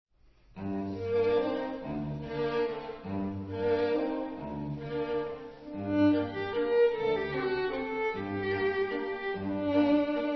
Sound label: String Quartet